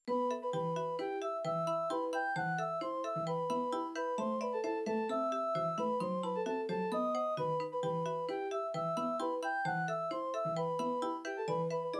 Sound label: nokia2600 verdi ringtone